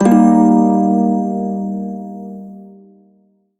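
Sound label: Prime Shawzin Pentatonic Minor Chord, Music; Warframe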